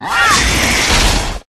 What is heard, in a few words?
Cat; Pet